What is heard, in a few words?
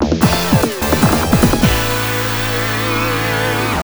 Fatality [Game Over]; Battle Garegga; toaplan 2; arcade